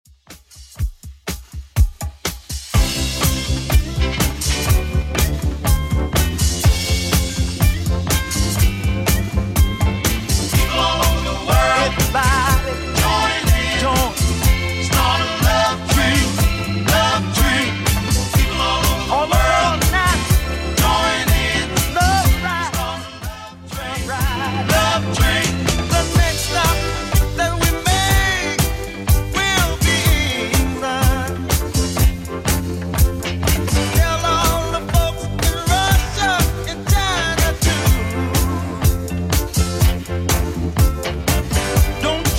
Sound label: 70s Redrum, 123 bpm